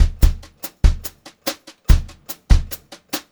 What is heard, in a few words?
Brushed Drum Loops; USB Soundscan vol.39; AKAI